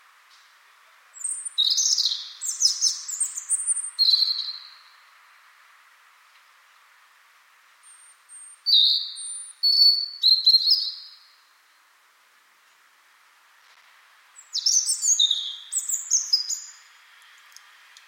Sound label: European Robin, Rotkehlchen